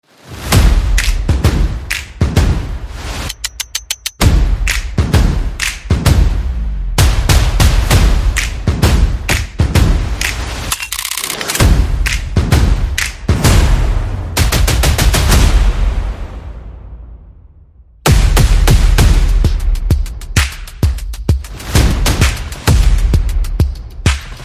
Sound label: Percussion and Effects